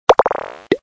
sputter bounce

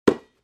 Sports Basketball Catch

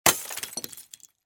Crash Glass Rock Throwing Through Glass Window

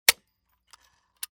Weapon Gun Dry Fire

